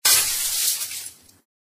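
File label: Glass break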